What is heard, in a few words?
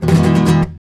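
RASGAND F major, FLAMENCO Guitars; Spectrasonics; Hans Zimmer Guitars VOL-2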